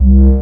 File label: warp